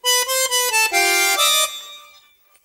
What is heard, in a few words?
Noisy Accordian; pingu the original soundtrack